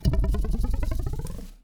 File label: Ruler